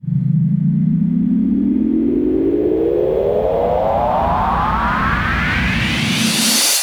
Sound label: Uplifter